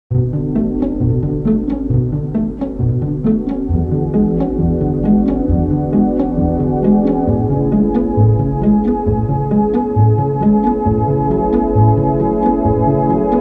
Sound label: waltz